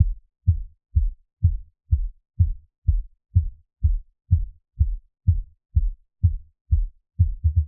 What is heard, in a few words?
disco